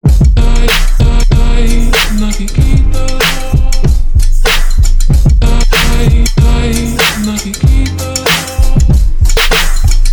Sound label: South American Funk